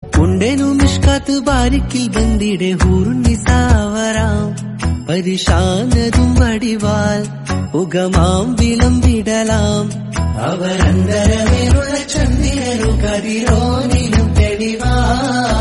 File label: Tamil South Indian